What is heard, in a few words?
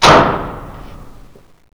power slam; svencoop; stadium4